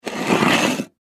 Dinner Plate Pull, Dinner Plate Pull Close Scrape Table; Dishware; Household; Blastwave